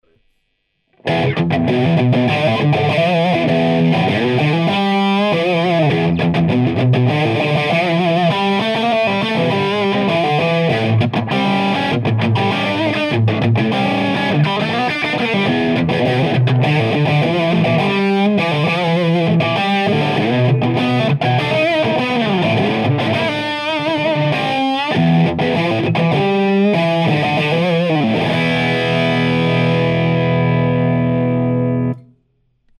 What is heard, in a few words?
lead